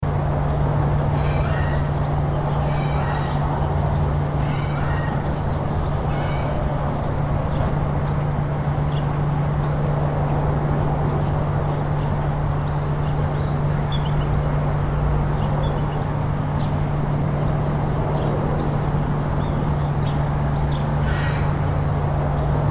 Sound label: atmo arizona williams residential morning